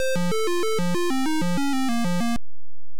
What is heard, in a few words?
Title Beeper; Gunfright